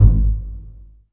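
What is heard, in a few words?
strings pizzicato; ILIO; Kirk Hunter; Virtuoso Strings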